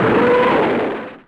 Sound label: katyusha; WAR